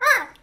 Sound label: Bird Blue Talk; fowl